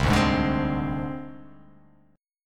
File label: C Suspended 2nd Sharp 5th, chord; Csus2#5 strummed; JGuitar